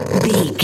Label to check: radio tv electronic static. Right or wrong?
Right